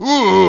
pain; konkey dong

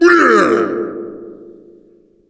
halloween boo, ghost

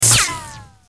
zap neg; Oddworld; Munch

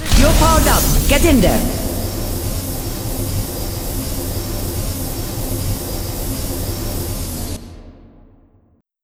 nano boost; tf

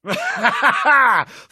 laugh

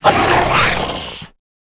serpent; tf2pets

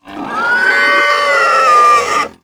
death; tf; slender; gabel

